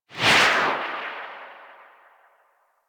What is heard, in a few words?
Atmosphere Flyby Left, Vehicles Movement; Warframe; Archwing